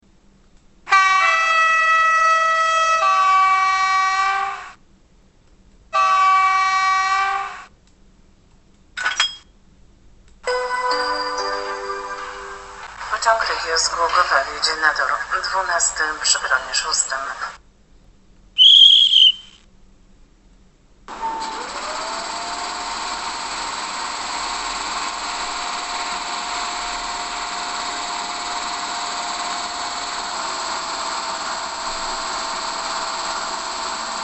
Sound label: EU07 Sound